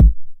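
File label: 909 BD tape, kick drum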